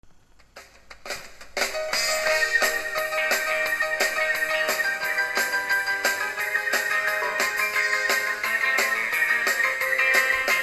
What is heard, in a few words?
Rock and Pop Orchestra